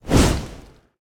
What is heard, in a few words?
phoenix fire; smite; npc